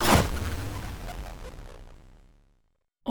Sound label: danger flourish